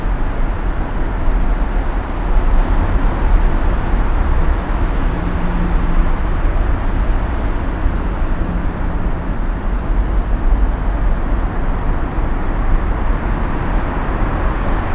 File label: tunnel under rails